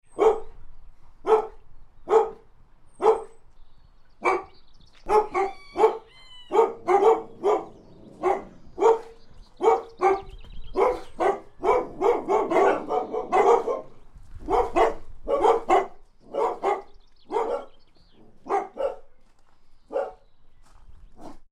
Dogs Barking Labradors, Dog bark then second dog joins in - Exterior; Tom Hutchings